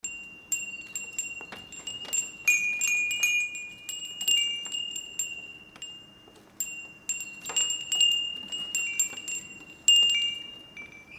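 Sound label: furin, wind chime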